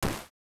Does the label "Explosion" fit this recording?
Yes